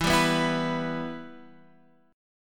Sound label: E Minor E, Guitar, Half Step Down